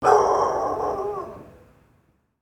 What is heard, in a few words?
rottweiler, dogs